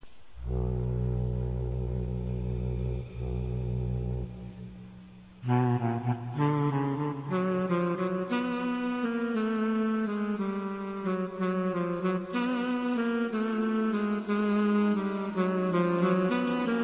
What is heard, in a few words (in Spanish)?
Solo de saxo